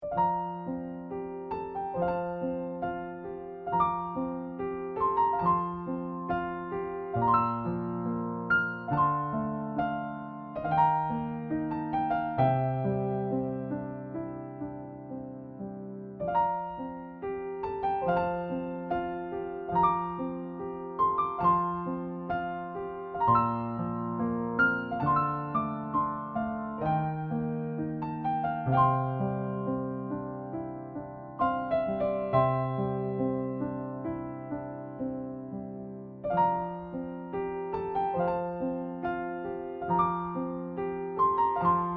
Piano; tamagotchi adventure kingdom ios macos gamerip 2024; Piano Master

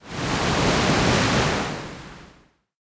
Water

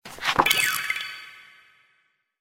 login bonus

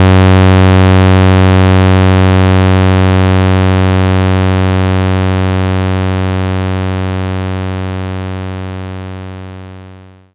Saw Incline 93 75Hz; knights try

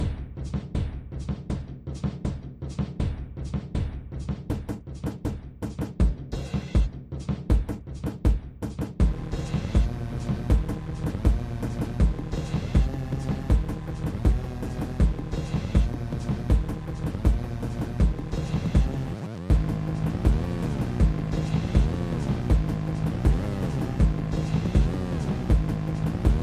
Boss Music; the upturned

